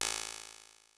Techno Clank; Convolution; IR Samples; Unusual

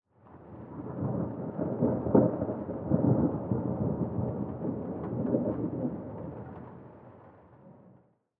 thunder

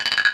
open; Quicken

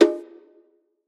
Conga Chop; One Shots; Drums; Ableton Live 12 Lite; Core Library

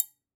Triangle Mute; One Shots; Drums; Ableton Live 12 Lite; Core Library

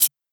Hihat Closed

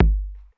Kick; Ableton Live 12 Lite Core Library

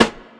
Snare, drums; Ableton Live 12 Lite Core Library

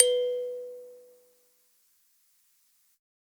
Ikembe B2; Multisamples; Ableton Live 12 Lite; Core Library

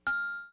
open bell; Quicken

